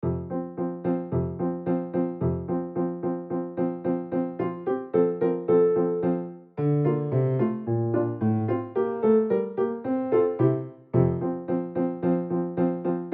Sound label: Tambourine slow